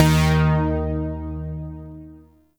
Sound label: Shawzin Oct One D Sharp; Zariman Shawzin